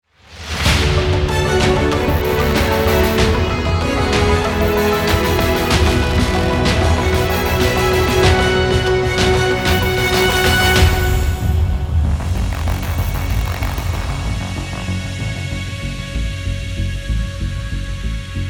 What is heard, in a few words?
win; bluestar